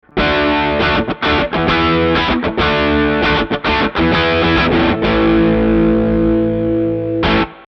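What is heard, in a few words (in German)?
E-Gitarre Crunch; Nohype LRM V; RME UFX 36db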